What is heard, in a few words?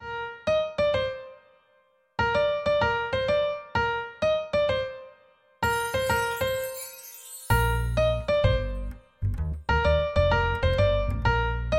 Comedy, BPM128